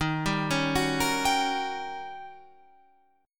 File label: Eb 7th Sharp 9th, Eb, Guitar, Open F; arpeggiated; 15,14,13,13,13,14